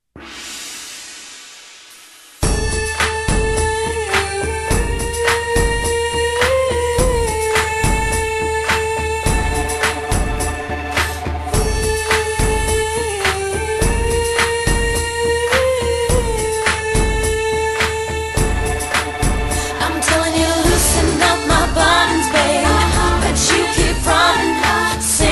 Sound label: buttons small